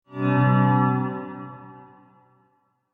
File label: Guitar Chord Musical Transition Reveal Chord Swell; Sound Design; Guitars (Sound Effects:Musical)